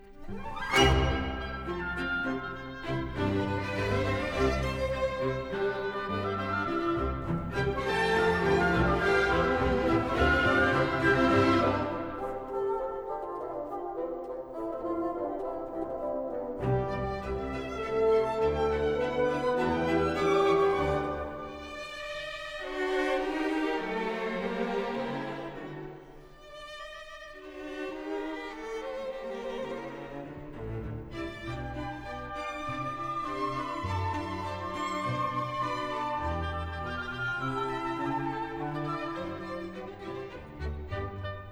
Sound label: Pulcinella, ballet with song, orchestra, Scherzino; Allegro